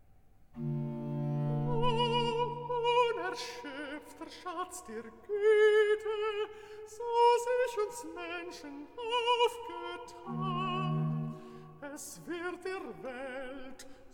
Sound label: Recitativo (Alto); Bach Johann Sebastian; BWV0125; Mit Fried und Freud ich fahr dahin; 'O unerschöpfter Schatz der Güte'; Kantate Mariä Reinigung